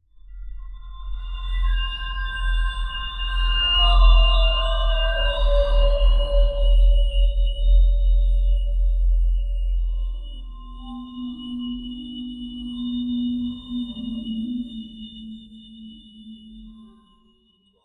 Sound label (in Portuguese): eu quero um som grave, energy explosion